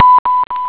shark; xboing

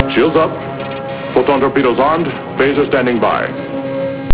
shields up; startrek

